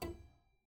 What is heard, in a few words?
Harpsi Lute Rel E3, Harpsichord, English; Zuckermann Kit Harpsi; Chordophones; Releases